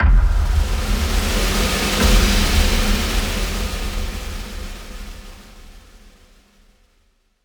Stinger Victory Panel; starcraft 2 wings of liberty

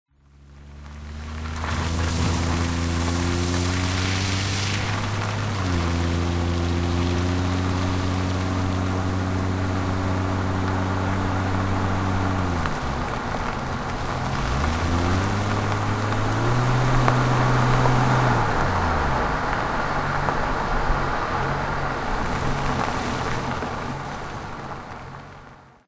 Car On Gravel; hello neighbor pre-alpha alpha 1

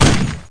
fire 50BMG

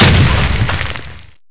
explode grenade

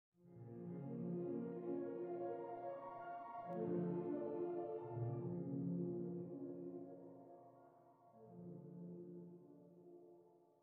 Piano

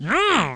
medikit; super trench attack 2

